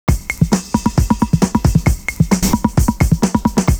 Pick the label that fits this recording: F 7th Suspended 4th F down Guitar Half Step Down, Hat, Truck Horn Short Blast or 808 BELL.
808 BELL